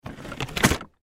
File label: Cardboard Box Junk; Cardboard Box Junk Open Lid; Foley; Materials; Blastwave